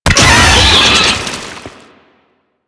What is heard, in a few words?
Brachiolyst Pain, Vocals; Enemies; Warframe; Proto Infested